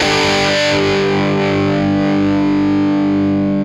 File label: GRUNG SUS4, Guitar; 90 s Sample CDs; Quantum Leap - Guitar & Bass